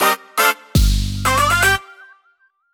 Jingle Finish; Clubhouse Games: 51 Worldwide Classics

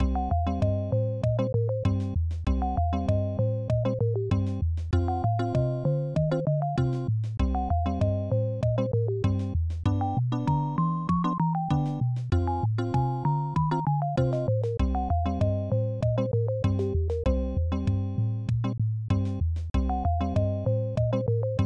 Level Music; Clock Works; SNES

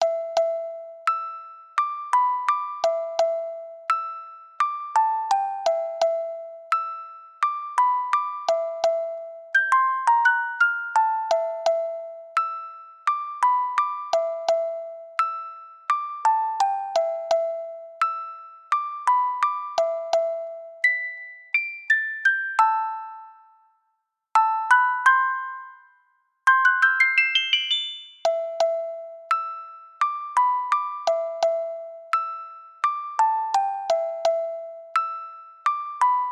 Rock, Music Box; animal crossing new leaf welcome aamiibo soundtrack

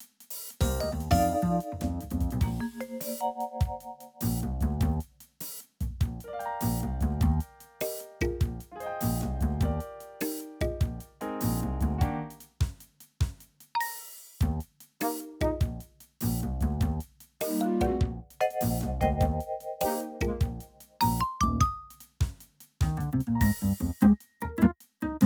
Dice BGM Draw; 1-2-Switch

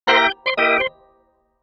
Smooth Jazz; YIIK A Post Modern RPG

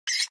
Rat; peace death 2017 pc

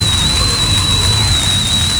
rocket fly

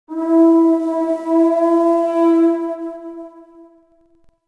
Music Start Battle Sting

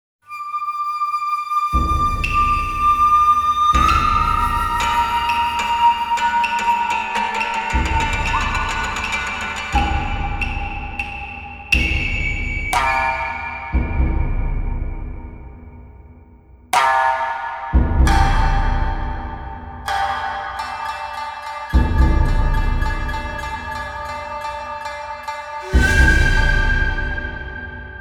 Stage Pre-Narration BGM; tenchu senran; Heaven and Earth

